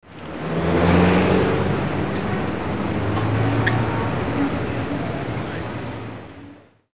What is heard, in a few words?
TRAFFIC, commercial